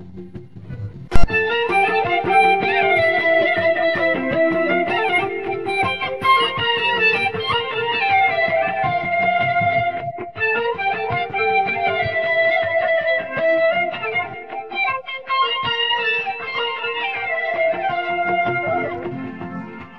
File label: guitar